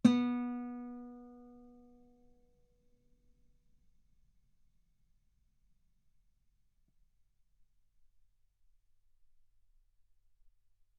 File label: Guitar B3; ff; sul B